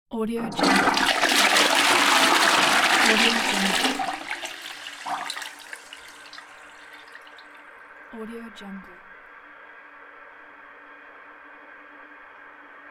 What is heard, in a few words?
toilet flush; ponyrai